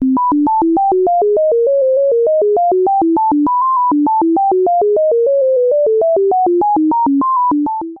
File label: chromatic illusion fade Right; Chromatic illusion (two-octave); Deutsch's Chromatic illusion (two-octave)